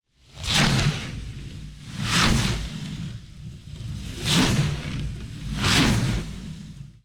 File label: Sliding Whoosh By; Coll Anderson